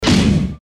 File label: short industrial event